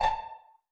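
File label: pizzicato A3 PP, STRING PIZZ; Sound On Sound - Peter Siedleczek's Orchestra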